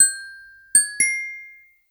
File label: Diving Owl